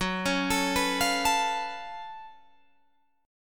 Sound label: Gb Minor Major 11th Gb down Guitar Drop D